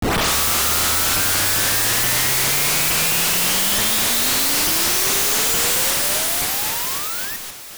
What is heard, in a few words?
Water Jet; Zelda